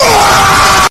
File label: aughghghghghghhg